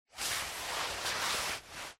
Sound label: foley jacket